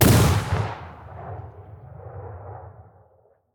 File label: cannon; pirates